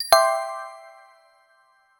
short cheerful ui success, chime

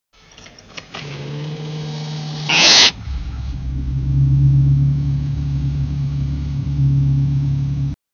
a car tire filled with

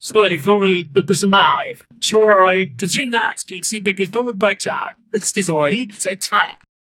podcast outro

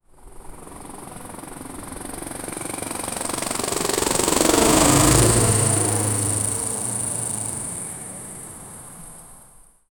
the quadcopter accelerate, drone

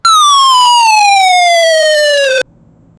epic sound of legendary, card

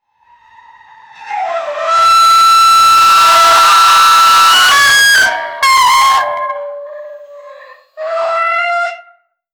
horror game

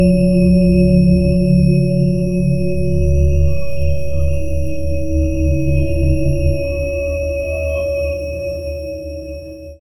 soft electronic etching

